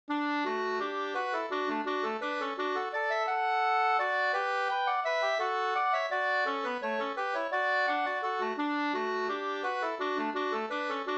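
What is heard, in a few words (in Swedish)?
vals, two-part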